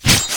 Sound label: Knife Scale, Damage